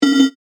Sci Fi Beep, Production, Element, Science Fiction, Beep; Communication Sound Effects; BlastWave